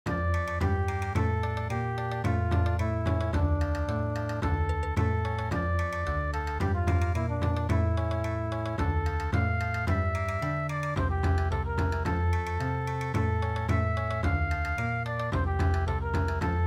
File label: Bon Odori